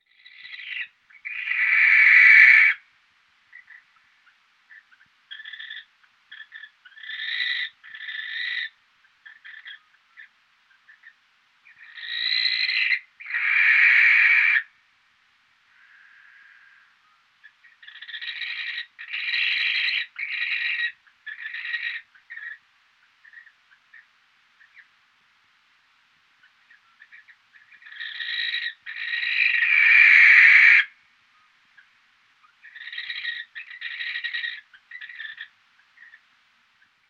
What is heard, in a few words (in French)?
chouette effraie cri